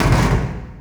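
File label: school locker; Tony Hawk's Project 8; XBOX; shops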